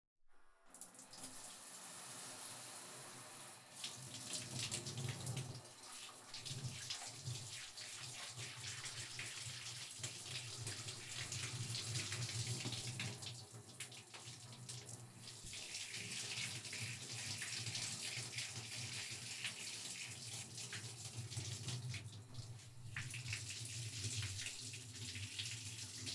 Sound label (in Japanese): 自分 シャワー で 髪 を 流す; KU100; お風呂に関する音